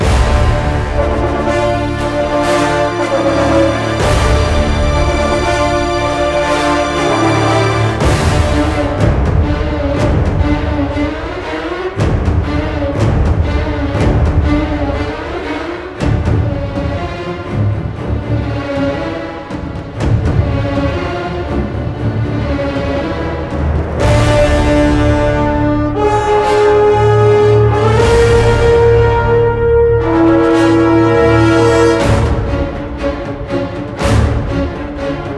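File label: Reinforcements Arrived Loop; StormKing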